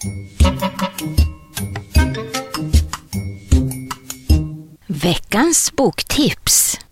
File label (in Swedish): Jingel Boktipset